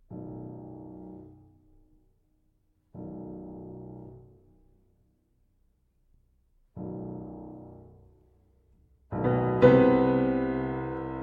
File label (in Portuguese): Sonata Para Oboé e Piano